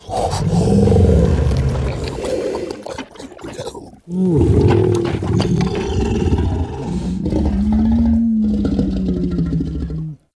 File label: Juggernaut Truffle Effects B; Warframe; Infested; Truffle Hunt